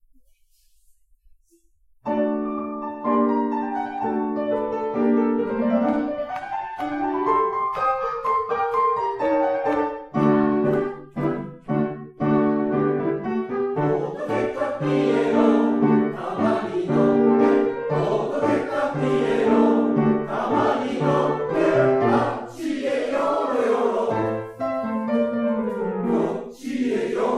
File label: tamanouede with piano